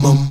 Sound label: VOX MUM C#3C, Afro Chants, Stacc Mums; Roland LCDP11 Africa VOL-1